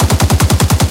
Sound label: loop kpvt; guns tanks